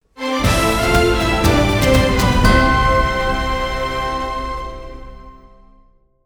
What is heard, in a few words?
Out of Break Sting; Sitting Duck; Daybreak